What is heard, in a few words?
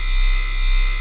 loop puls; moon